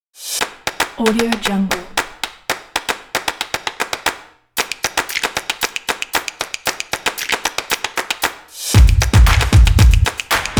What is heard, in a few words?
the percussion